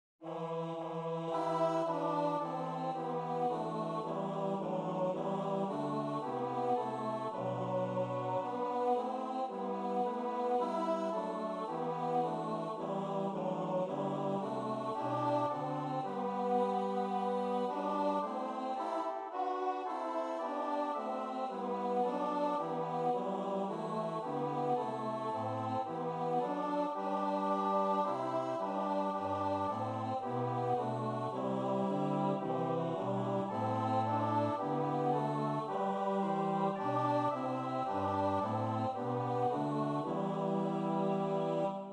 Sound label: tenor; God Rest Ye Merry